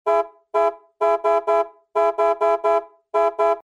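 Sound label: car horns; avtomobilnye gudki; soobshcheniia sms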